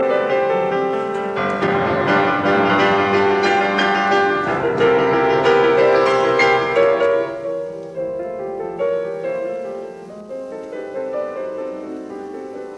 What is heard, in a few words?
Variations in C major on a Waltz; 33 Variations; Op 120; Allegro